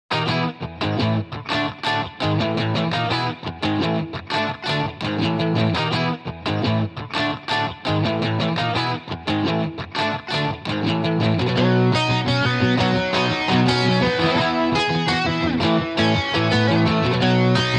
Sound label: Guitars Only